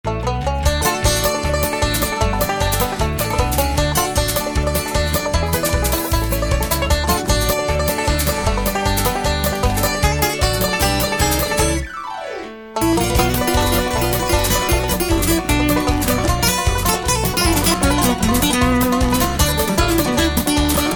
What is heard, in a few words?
Banjo Rag